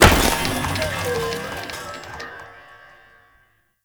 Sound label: Target Dummy Impact; Warframe; Duviri; Waiting Room